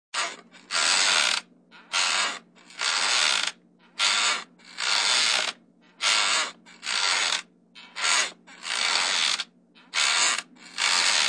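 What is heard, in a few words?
Creaks repeating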